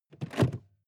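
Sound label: sports car interior seat lock; verkehr transport; autos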